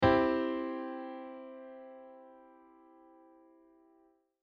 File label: C Chord